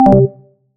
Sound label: deafen; odoo